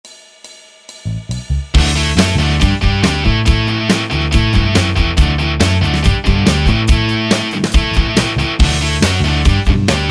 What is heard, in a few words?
Backup Track D Major